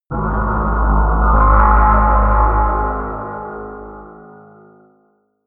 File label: scrapes tensing scariness, drones